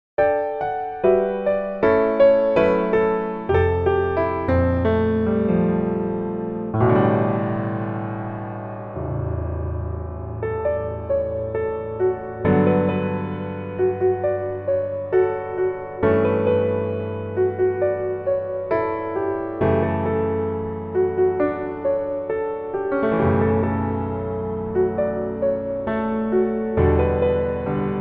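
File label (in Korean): Piano version 멜로디MR 남자키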